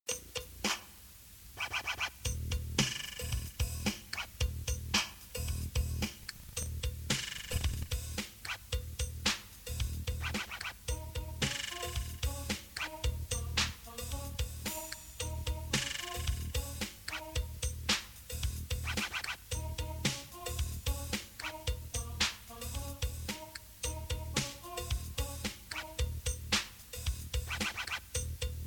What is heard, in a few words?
piano At End